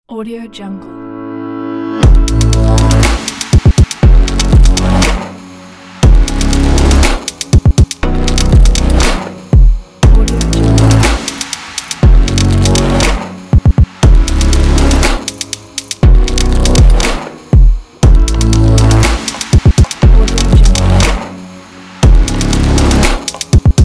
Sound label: technology fashion glitch